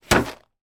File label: gerbil pet food bag drop on shelf; sound effects one